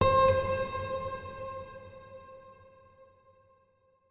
Chime; Chime Sharp

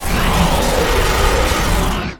Magic

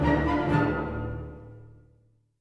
Music Dialogue Dramatic End 64bpm; Asterix Obelix Slap Them All 2

